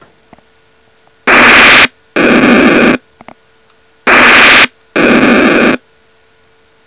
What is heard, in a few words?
roket